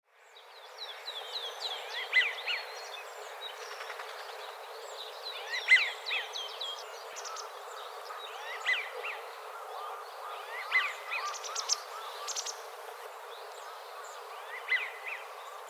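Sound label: amerikahidori, American Wigeon; koe; kitami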